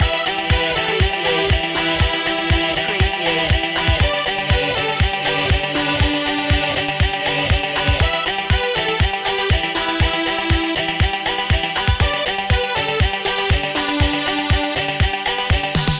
dubstep gamer loop

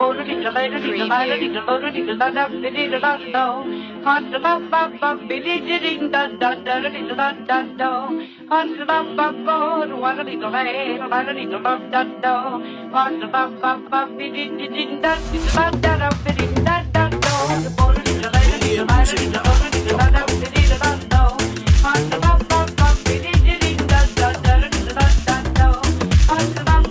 funky scat 108bpm

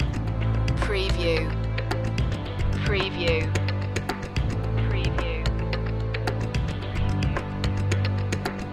epic intro loop

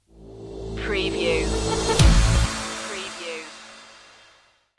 accelerate stinger

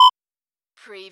Servo Panel Sound Beep short

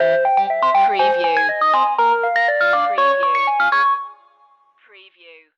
8-bit boss stinger

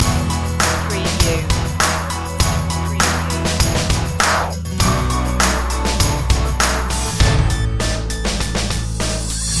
positive flow loop

